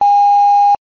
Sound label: error Beep